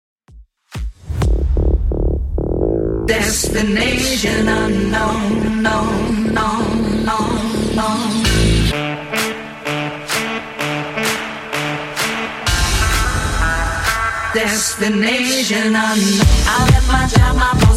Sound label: 2ks Redrum 128bpm